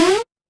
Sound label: retro game jump